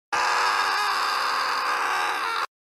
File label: internal screaming